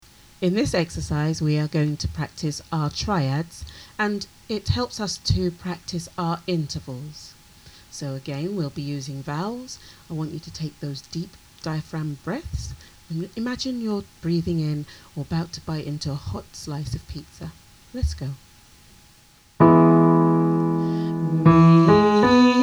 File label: Intervals